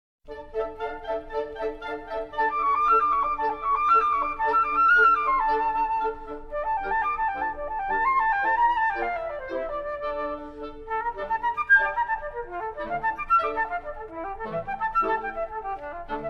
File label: Concerto For Flute & Wind Ensemble - Mvt. 1. Toccata